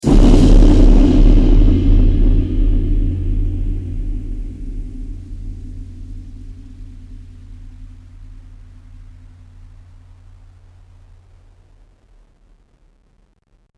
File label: bomb